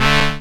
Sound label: SYN CHD; E-Lab; X Static Goldmine 2; akai